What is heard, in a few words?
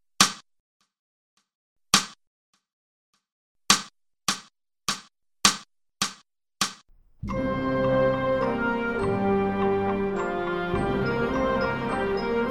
Korean Folk Song Medley